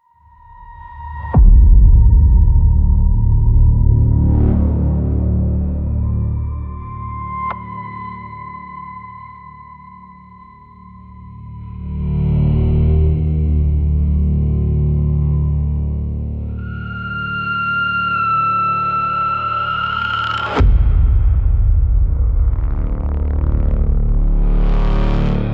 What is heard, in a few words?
spiritual spooky soundscapes